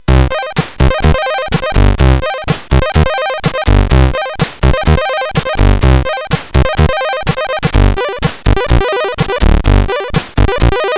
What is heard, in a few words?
One Channel sid CSG8580R5; Sidder